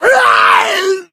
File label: hunter pounce; source engine; left 4 dead 2